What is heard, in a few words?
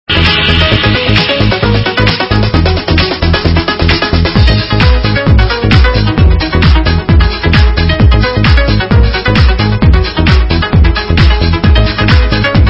TRANCE